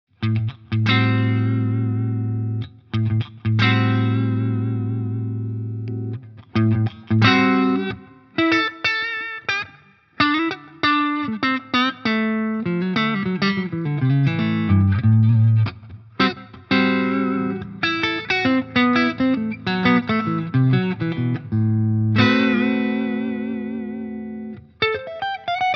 Clean Boost; PRS Horsemeat

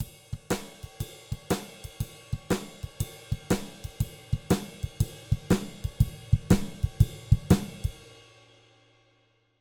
Drums LF HPF; RND Portico 551

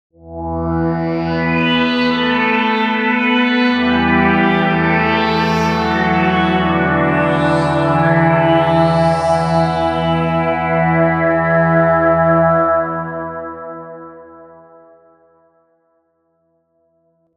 Synth Pad; Tegeler Audio; RaumMaschine; Companion

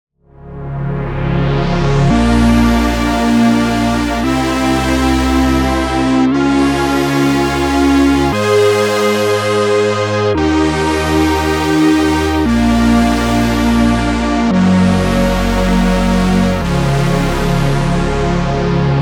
Oberheim OB-E Unison; G-Force Software

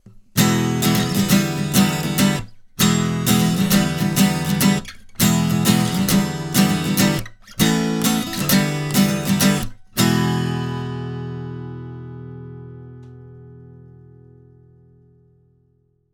Acoustic Guitar; NT3; Chandler EMI REDD47

